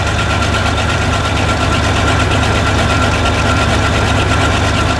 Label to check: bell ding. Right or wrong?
Wrong